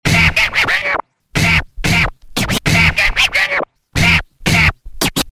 Dj Puzzle Scratch

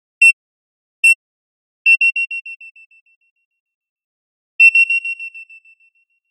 Scan Bleep Variations

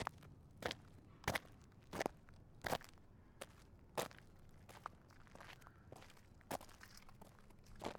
Footsteps On Concrete

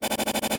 Machine Gun

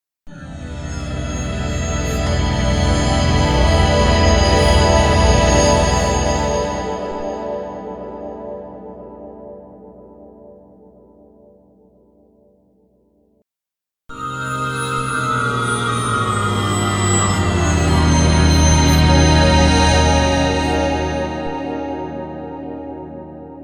Retro Console Intro Music

